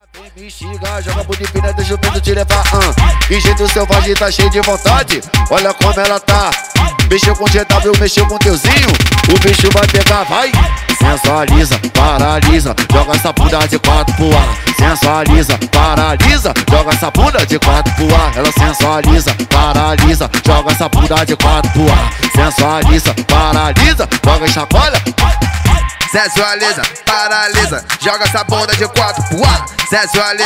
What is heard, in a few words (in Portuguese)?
Ritmo Envolvente